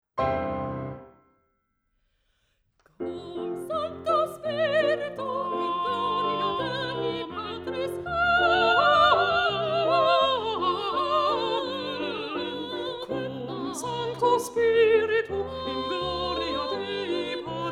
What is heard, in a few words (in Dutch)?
Tenor, Cum Sanctu Spiritu; Rossini; oefen CD; Onversterkt